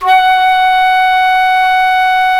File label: FLT FL AC, C Flutes; Roland LCDP04 Orchestral Winds; 90 s Sample CDs; C Flutes 3-8